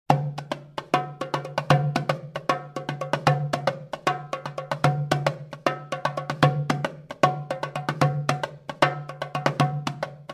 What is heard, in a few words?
Darabukka